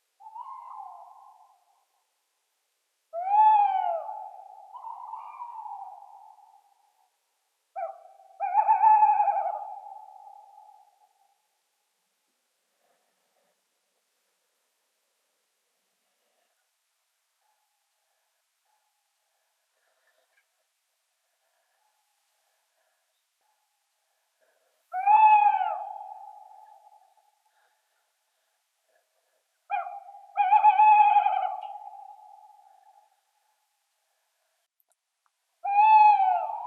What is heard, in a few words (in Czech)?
sova; divadlo